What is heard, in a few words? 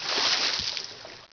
splash; gearbox; hatchet